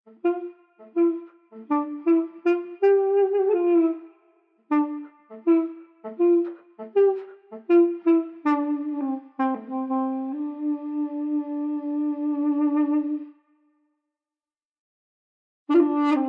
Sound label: Duduk; raji an ancient epic